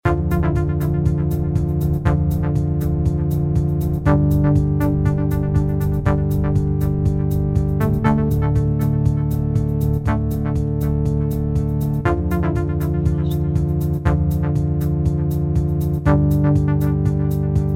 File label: organ Drop